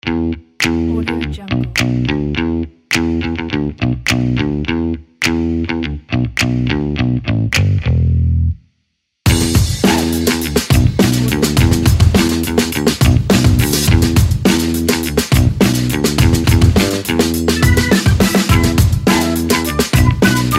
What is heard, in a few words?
funky upbeat